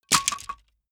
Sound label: wood sticks small break metal small